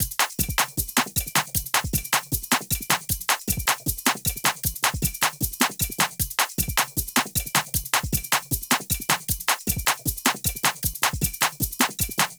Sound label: loop 155bpm, gospel praise break shout percussion loops